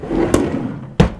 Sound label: drawer close